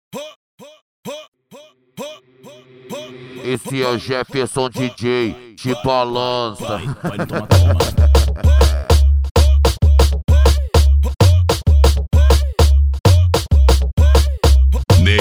summer eletro hits mais funk

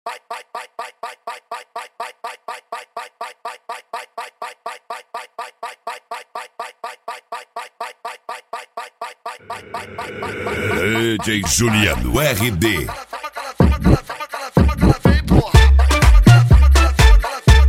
mega funk automotivo

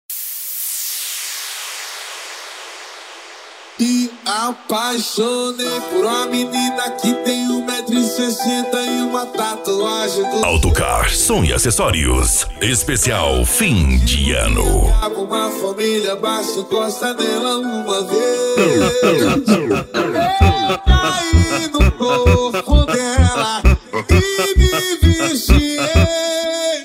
funk agressivo ritmado e funkadao

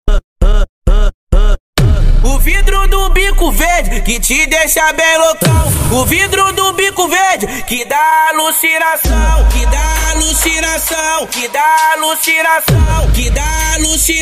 funk altomotivo; ap sport car som e insufilme

